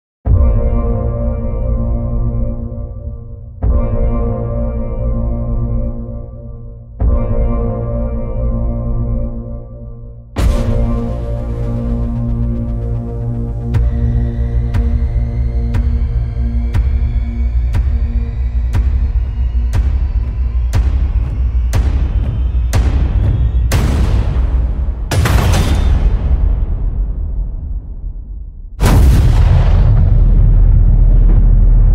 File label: Iconic analog hit